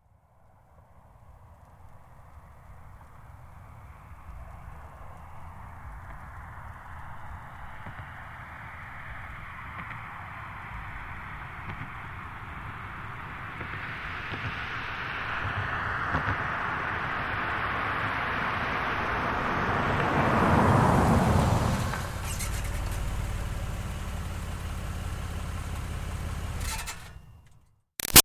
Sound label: Car Highlander Up Stop Fast; 2005 Toyota Highlander V6; Cars; Vehicles; BigRoomSound